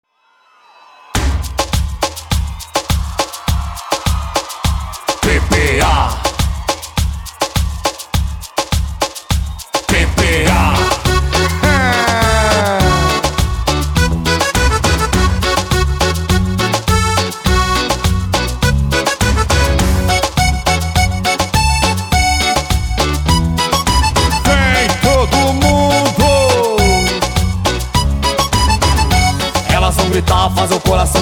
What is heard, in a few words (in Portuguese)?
arrocha sertanejo